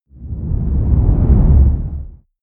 whoosh large wing dark

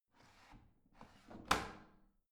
washing machine drawer in soft

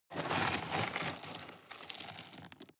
shovel dig soft

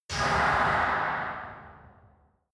metal crash

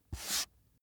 book dust off single

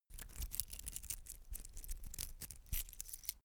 metal watchstrap rattle

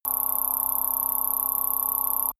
machine room

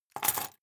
key ring large place table

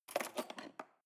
cutlery cake fork drawer scrape

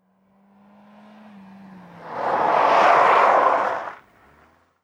suzuki arrive and brake

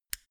mini switch turn on hard

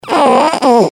baby snorts

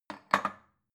bowl shelve

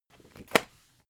spring binder close hard

